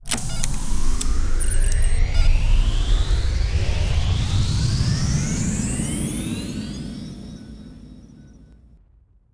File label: power on; Starsiege